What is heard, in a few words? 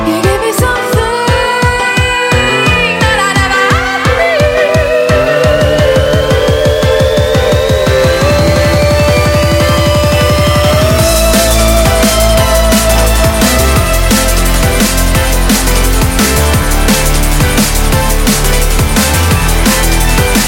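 drumnbass dubstep style drumnbass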